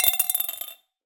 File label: Drop In Glass, Medium Wine Glass